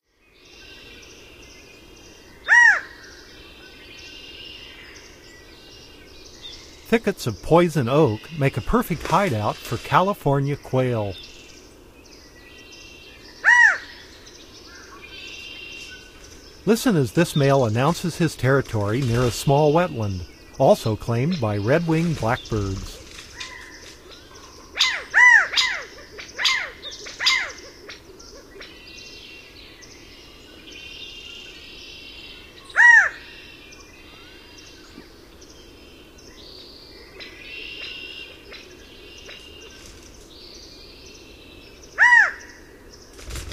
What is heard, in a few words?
California Quail; North America; Common Western Species; Know Your Bird Sounds